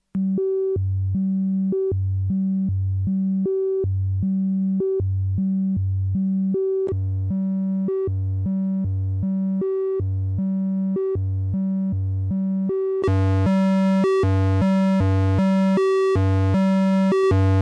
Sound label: vco